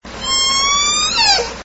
J pod stereo, SRKW calls

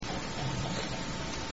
bloop, transient killer whales; salish sea

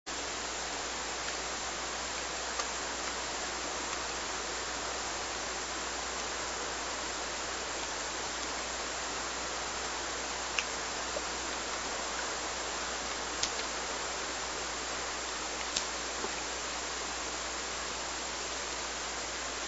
two splashes

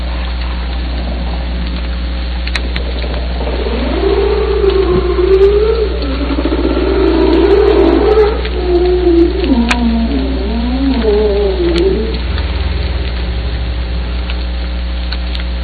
rising falling trill, humpbacks; salish sea data examples; signals

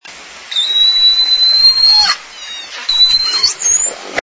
whistles, orca

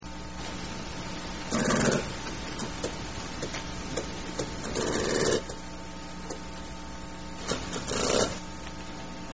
wood pecker sequence

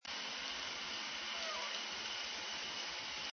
transient call

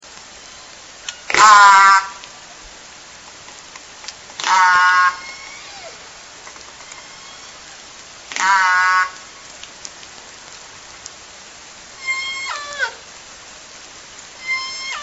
S4 S1 sequence, orca call

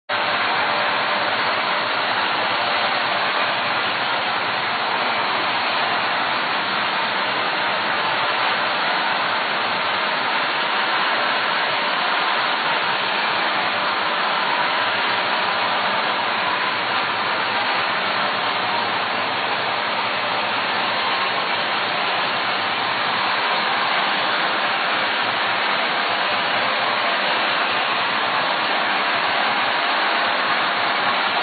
faint calls ship noise

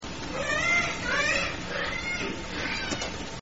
risers, transient killer whales; salish sea

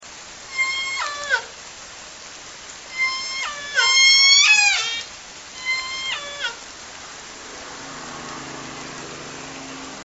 S1 sequence, orca call

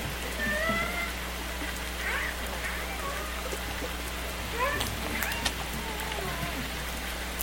misc calls, transient killer whales; Biggs; salish sea

